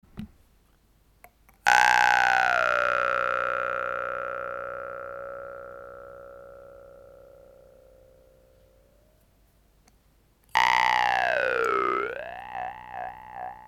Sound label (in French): sva bass, guimbardes